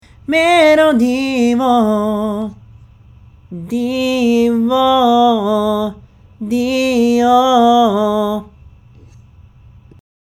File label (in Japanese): 歌; 抑揚について; vocal